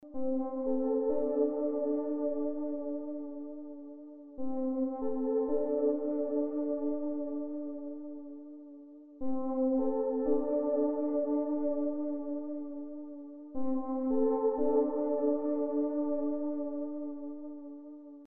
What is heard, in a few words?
pad, ambient